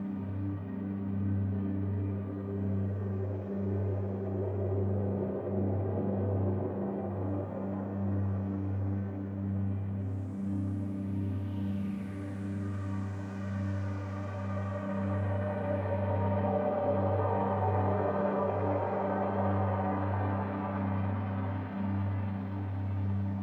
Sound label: space ambient; Stellaris